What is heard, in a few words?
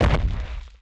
LARGE FOOT